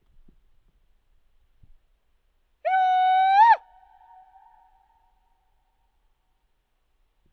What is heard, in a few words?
Mountain Call